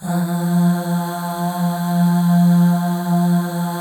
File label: AH FLAIR, FEM; Spectrasonics; FEM.2 AHS